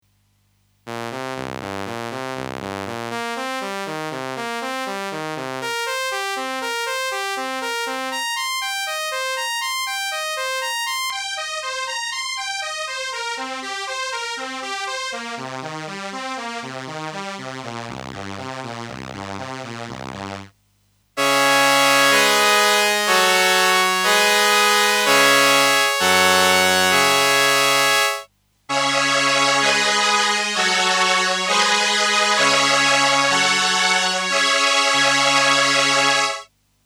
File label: Behringer Solina Viola dry mod chords